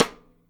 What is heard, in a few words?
Side Stick Med